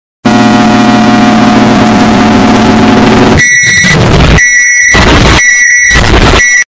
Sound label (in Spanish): Sonido de microondas saturado; Efecto de sonido